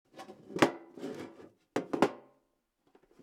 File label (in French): boite a outils; btp